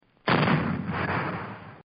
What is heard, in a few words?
ARTILLERY, Cannon Sound Effects; Guns; War; Partners In Rhyme